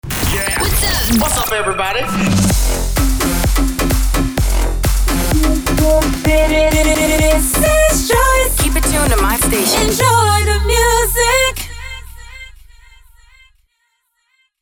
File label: Remix Jingle